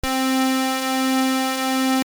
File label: SAW SQ DETUNE